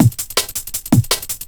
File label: break